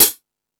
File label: Rock N Roll, Drum Machines